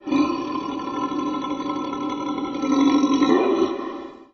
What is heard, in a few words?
Growl